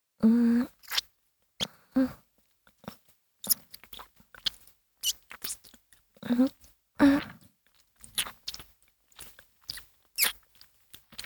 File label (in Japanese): キス(弱):唇同士が触れ合う軽いキス; 実用ボイス抜粋集